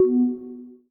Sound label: Lock; android frameworks base